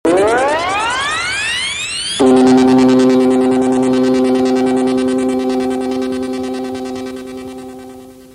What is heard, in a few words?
Stinger